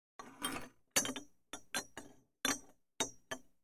Stem 1, version « Mix », avant égalisation; égalisation proposée

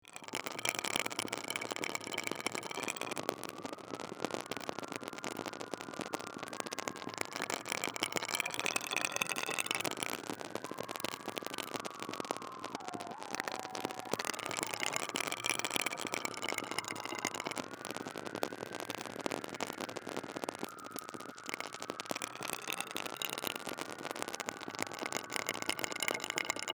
Stem 2, version « Mix », après égalisation

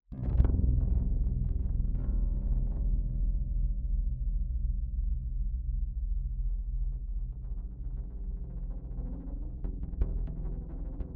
Basse électrique, version « Source », après égalisation dynamique réduite

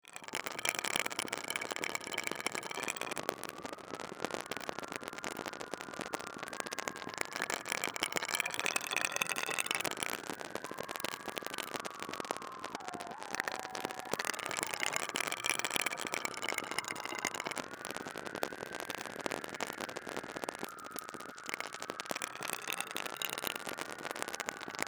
Stem 2, version « Mix », avant égalisation

